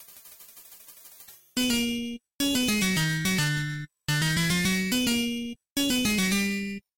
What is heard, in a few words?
Piano Solo; The Addams Family; Genesis